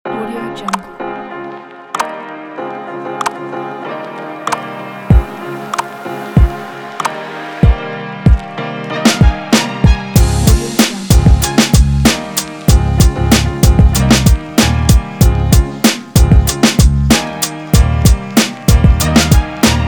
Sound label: hip hop beat upbeat